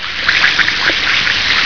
FIZZER

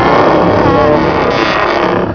MONSTER, ANIMALS

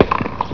BALL; IMPACTS

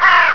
CROW; ANIMALS

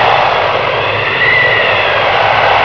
WIND BIG

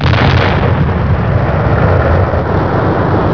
MISSILE; WEAPONS

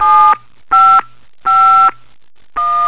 TELTOUCH, ALARMS

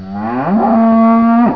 COW MOO; ANIMALS

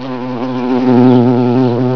BUZZ

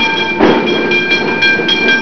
TRAM CAR; TRANSPOR

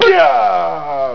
"NGYAAAAH", PEOPLE

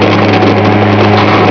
SPARKYLO, MACHINES

